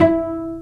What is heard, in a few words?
PITZ STRINGS